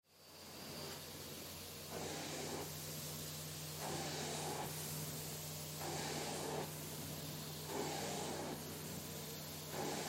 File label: Cleaners Steam; Ambience